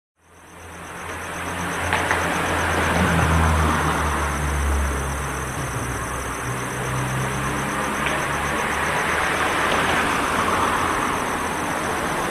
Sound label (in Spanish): calles rosario; brown 2600 1 hs 24 ene 2007